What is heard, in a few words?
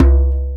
Djembe Single Hit; percussion; musicradar